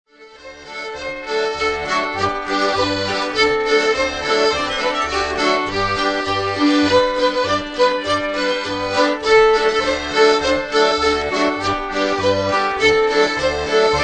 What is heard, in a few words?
quickstep from louth